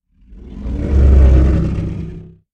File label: diplodocus idle